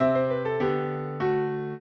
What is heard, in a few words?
trio, piano; Mozart